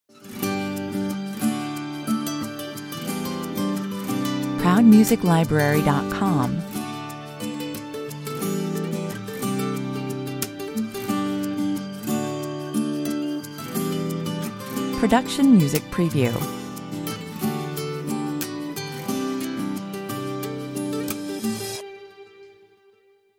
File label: short acoustic version 156 bpm